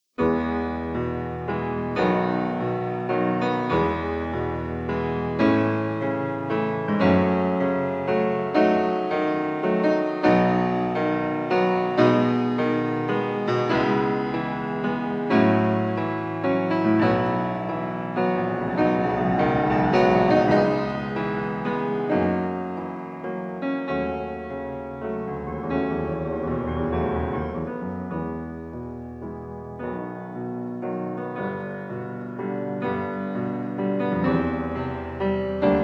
Prelude No. 9 in E major; F. Chopin; 24 Preludes, Op 28

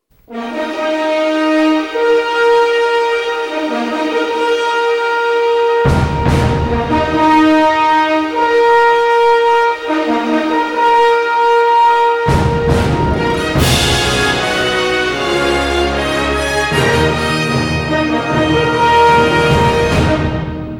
Fanfare; Universal Pictures

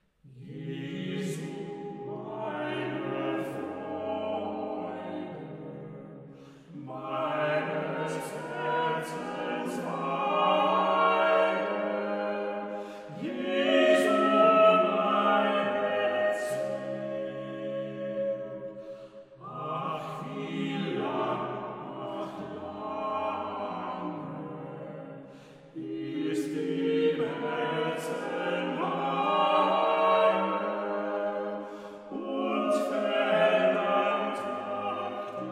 Chorale for 4 voices; 'Jesu, meine Freude'; BWV 358

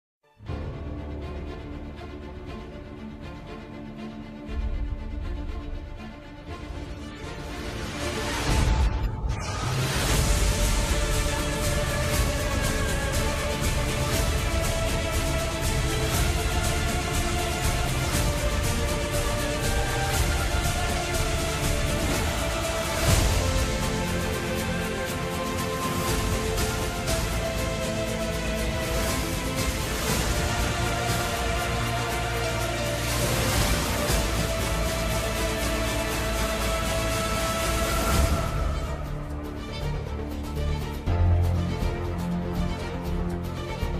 Trailer Music Short Instrumental Version; Cloud Atlas

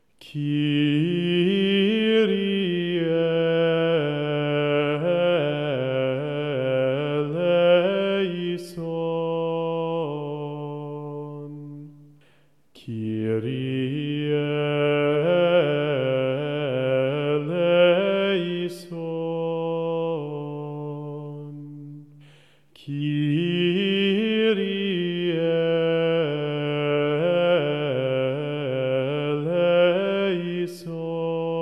Kyrie, Gregorian Chant; Kyrie IX from Mass IX

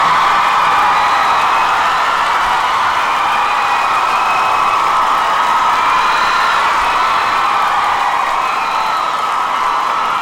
Crowd

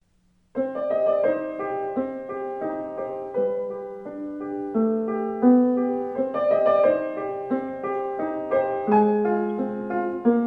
Piano Theme; Candyman

